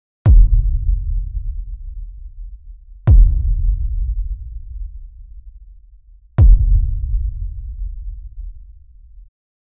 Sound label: Kick Drums; کیک درام